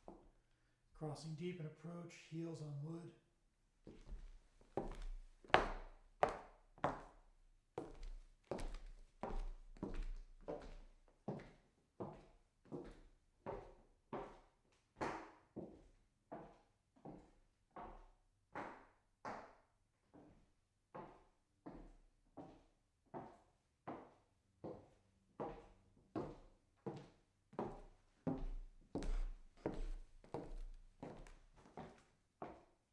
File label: deep cross on wood